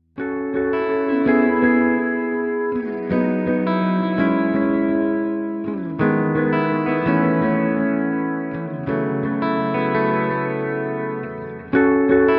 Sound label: echoverb; ventris